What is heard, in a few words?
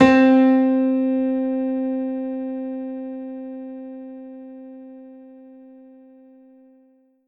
yamaha tg77 ivory piano c4